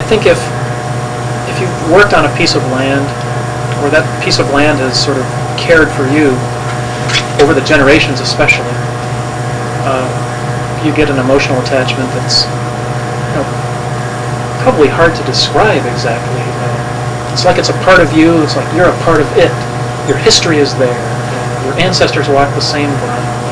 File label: nature ow; farmschool